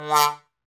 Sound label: BONE, Trombone; Solo Brass